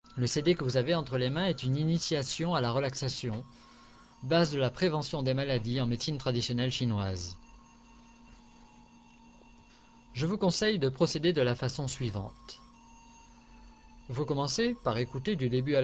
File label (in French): relaxation traditionnelle chinoise